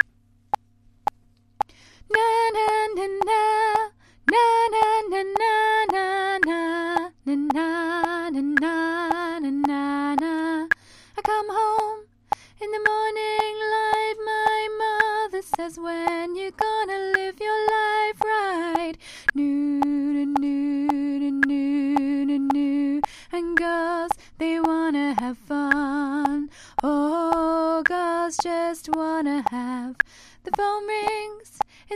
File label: alto middle part